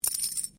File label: Coin Penny Multiple Move Hand; Common coins; Money; Objects